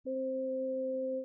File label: trumpet